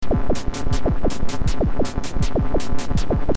ems FM beat

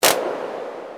drm1 Claps

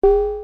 BD, vermona drm1

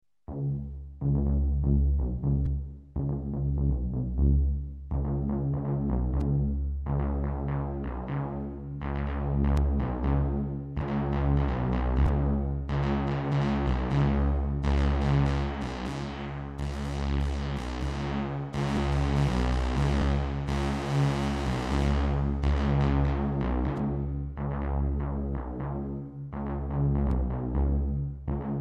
redsquare

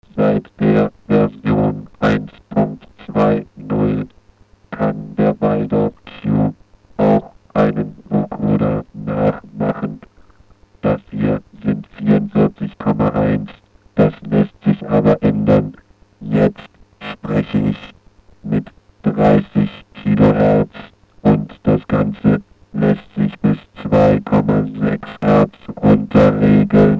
vocoda q, waldorf

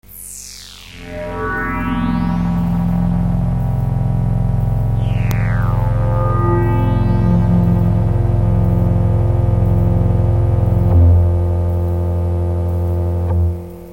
qfm

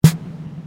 SD, vermona drm1

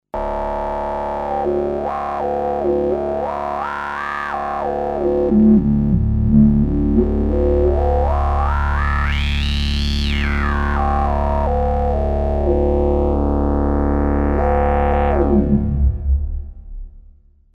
syrinx; synton

